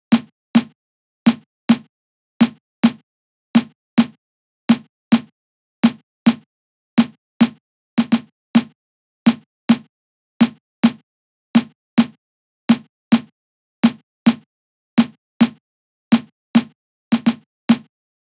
Snare 105

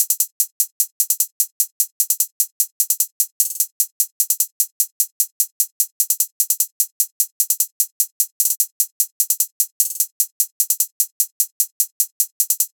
Drums 150bpm Hi Hats Loop Trap

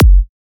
F- Kick

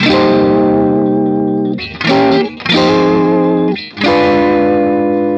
Guitar Riff Cm 89bpm rock groove

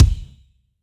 Roomer Kick

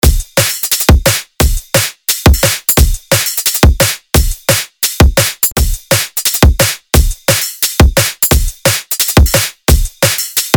Jump Up DnB Serum Presets; KULTURE

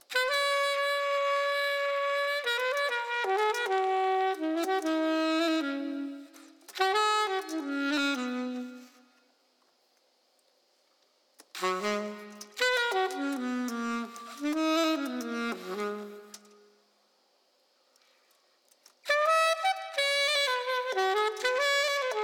Sax Vibe Loops

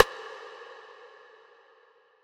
Percussion

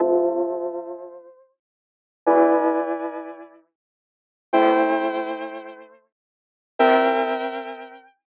Pad 106 Amin Dry

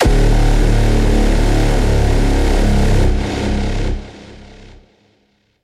Cinematic Hits

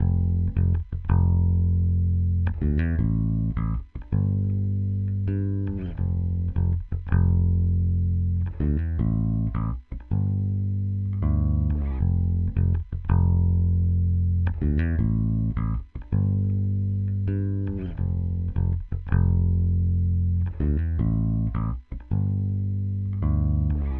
Bass

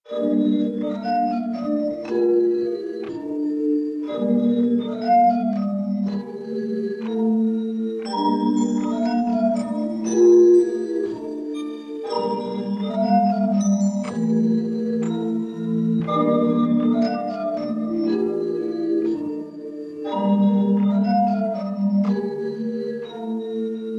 melodic loop, latenight vibe, 120, Amin

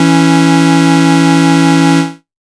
Chord Synth, A

